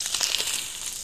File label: Grapefruit Fruit Qua; gore stabs & eat